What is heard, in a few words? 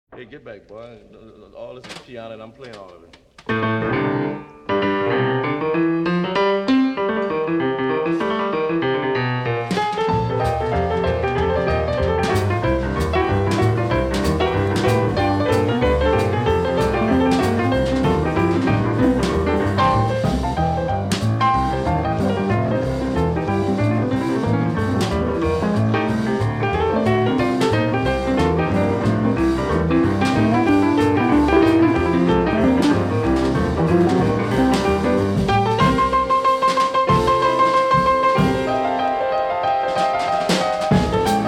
All this piano boogie